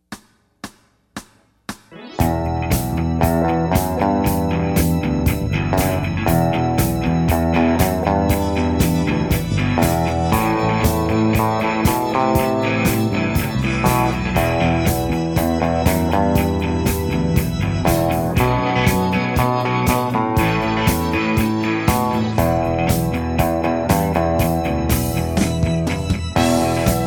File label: Overdrive; Grade One Expanded Internet Freebies